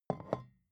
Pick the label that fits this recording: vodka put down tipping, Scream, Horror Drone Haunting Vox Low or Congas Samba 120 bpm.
vodka put down tipping